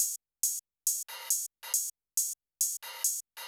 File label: CR78 RIDE, Techno 138 BPM; Best Service ProSamples vol.54; AKAI; HELLS DOOR